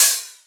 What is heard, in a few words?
Open Hat; FLPRO